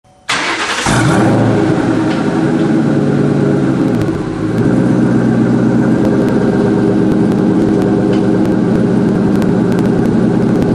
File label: X PIPE; Xhale